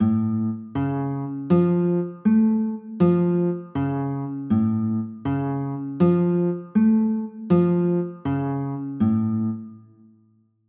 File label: a aug, chords